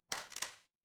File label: glass crack